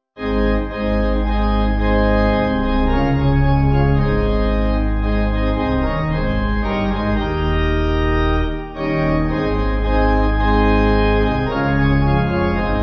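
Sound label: Pipe Organ; O For A 1000 Tongues; Hudson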